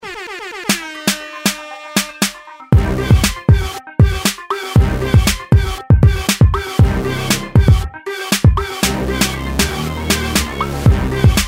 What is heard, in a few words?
street dance club battle